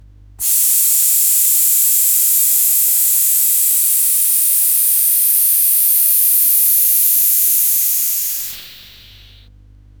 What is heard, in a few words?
oxygen mask soft airflow